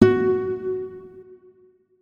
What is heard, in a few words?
notes, guitar; Hideout Furniture